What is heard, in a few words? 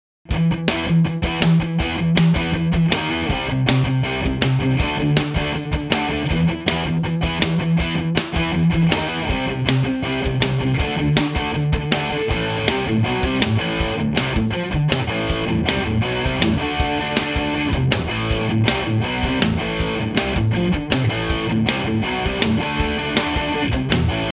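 Lead Old School Guitar Gibson Les Paul GT; Elite II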